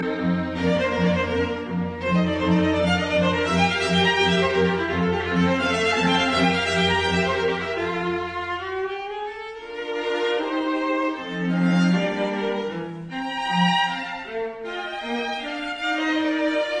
Haydn String Quartet in E flat major, String Quartet; I Allegretto; Op 64 No 6; Hob III64